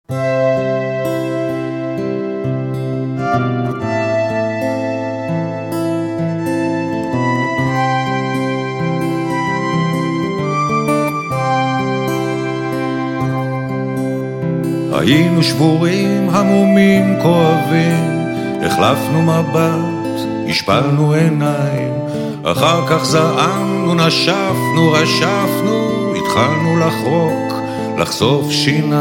simple song

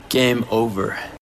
Game Over

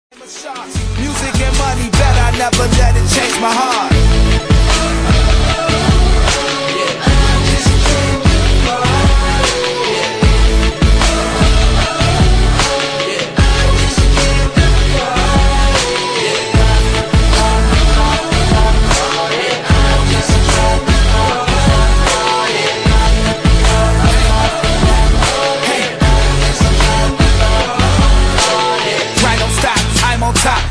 handclaps and guitars

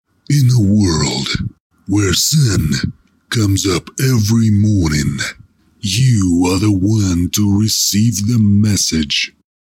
Sms

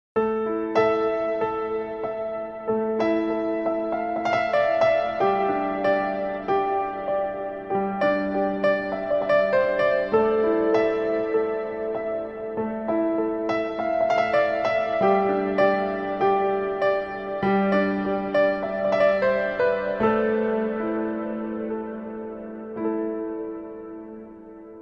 piano

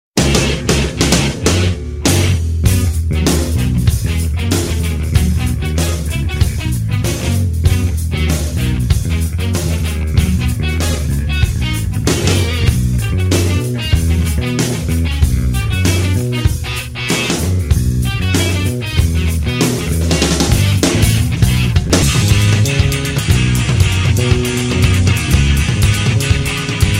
rock; mario

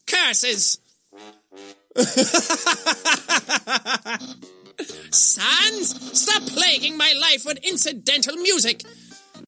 Papyrus Trombone; JSE

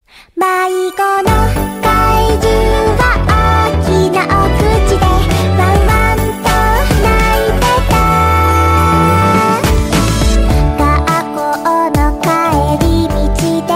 song cute; Kanna

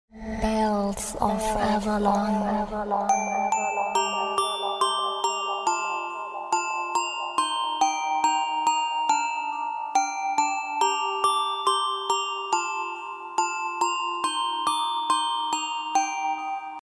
bell

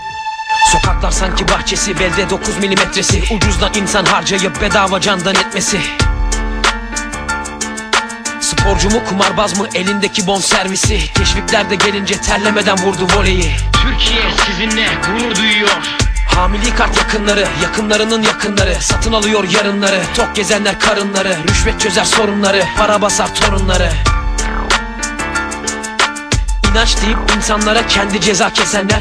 rap

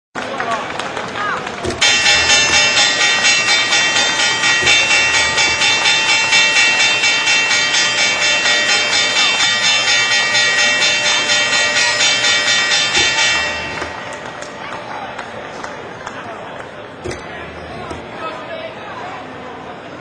Wall street opening

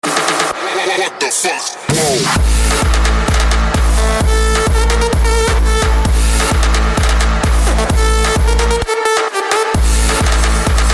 Electronica

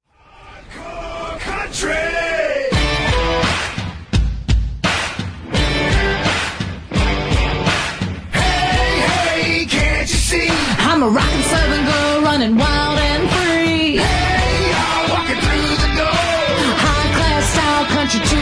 hardcore country

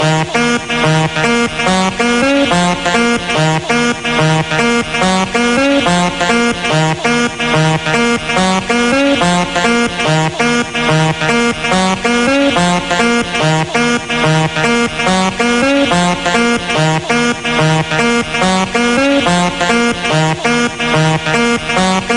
Horn Dancemix

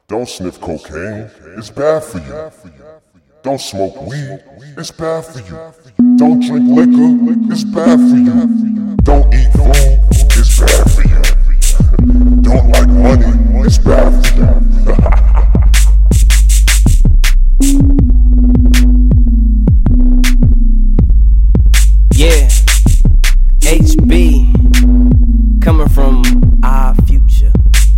beats; hip hop

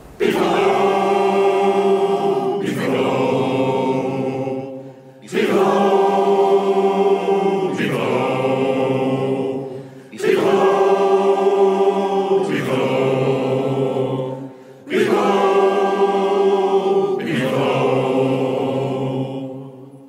chorus

